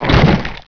gib, gibs

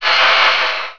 skull2; skullwiz

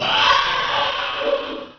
reveal

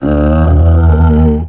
boil sight, zombie; quakemapping

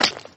gib i4, impact; mod quoth

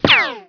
ric, weapons; quakemapping

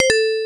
starpost, ambience; quakemapping

